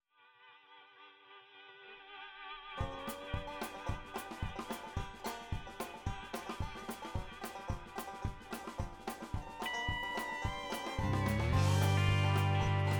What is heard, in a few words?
Country